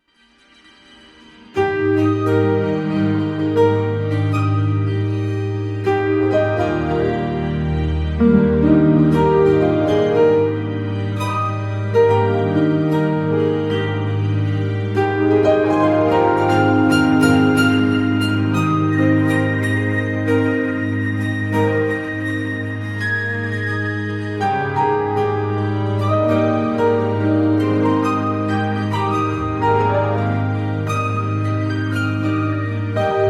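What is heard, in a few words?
a soothing ethereal composition, bell chimes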